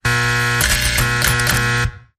Battery Hum

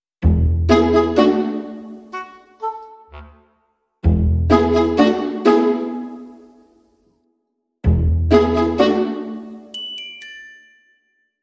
No Perc

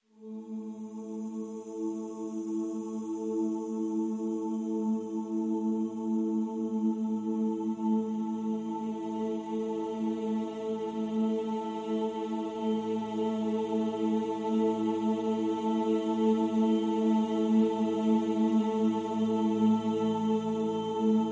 Pads Vocalicious (A)

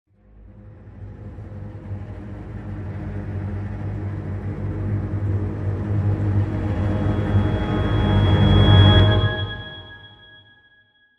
Dramatic Sting No Perc

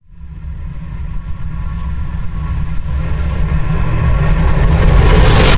Deep Suckback Vibes

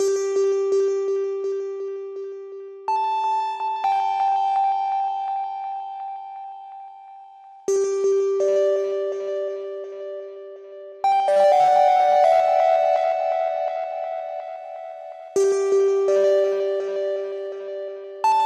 Synths

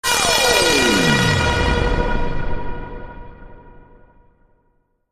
Pipe Echo Down

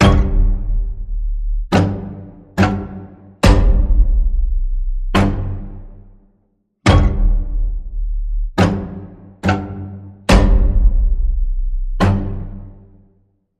String Pulse G, Key of G

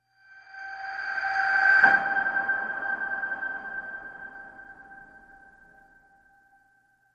Piano Ping Rev G

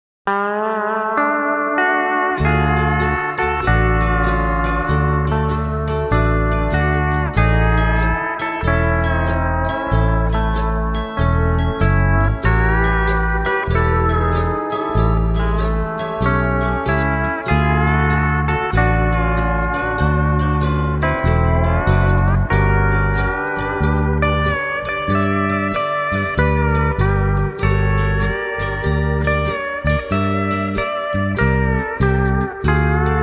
Hawaiian Guitar Ballad

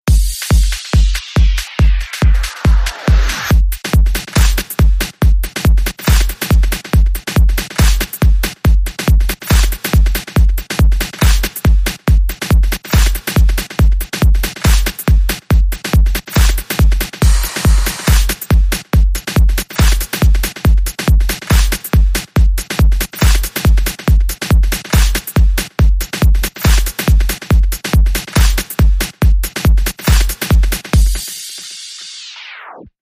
Auxiliary Beat

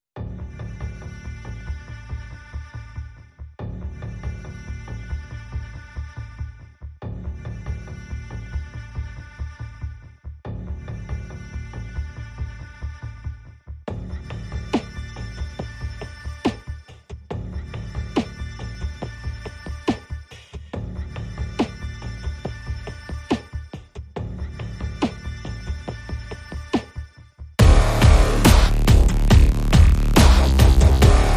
Wobble

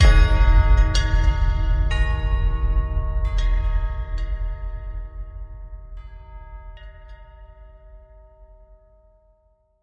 Holiday Church Bells Boom

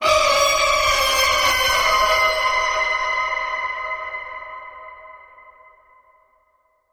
Phoenix Reborn Screech

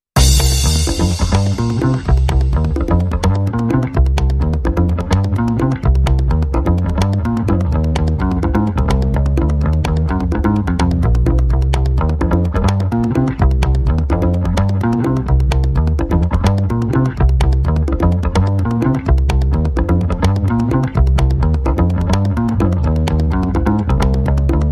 Perc and Bass Only

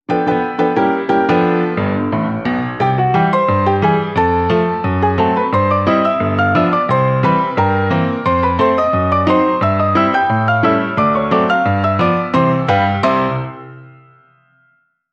Solo Ragtime Piano

